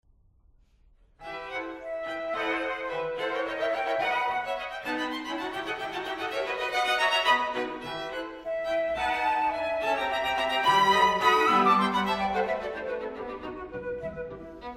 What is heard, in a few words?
Scherzo for flute string quartet